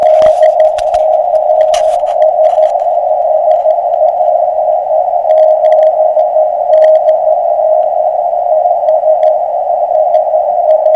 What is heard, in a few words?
EME CW